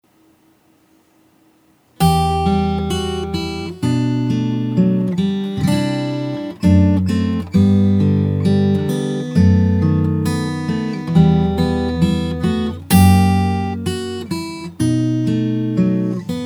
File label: more noise